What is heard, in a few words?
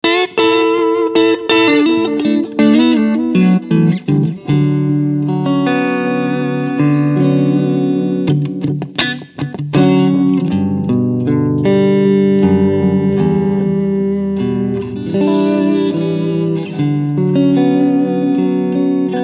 Echo